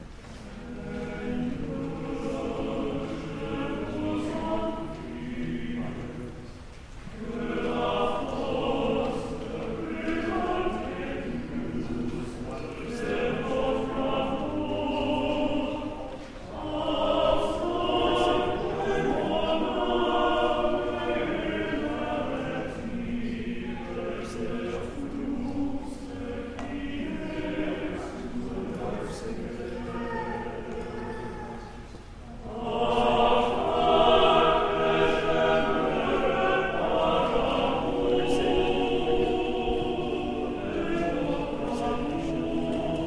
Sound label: Choral Choir